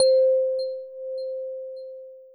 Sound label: end chime